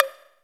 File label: WET BONGO; DRUMS; Kid Nepro; Elite